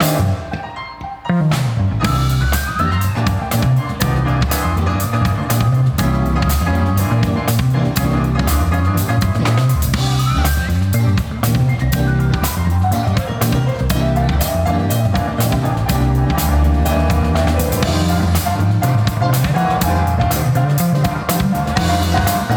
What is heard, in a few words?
Piano Shuffle; Mustang